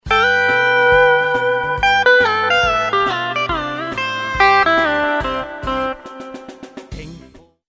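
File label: swing steel, steel guitar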